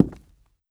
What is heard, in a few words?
walk wood